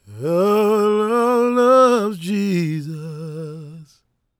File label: GOSP MALE, Vocal Gospel; Vocal Planet Vol1 Gospel